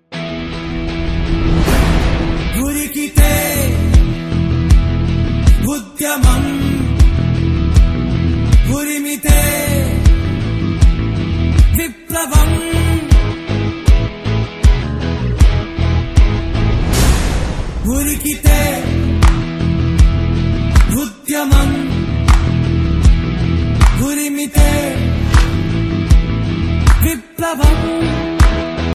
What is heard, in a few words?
telugu paino tune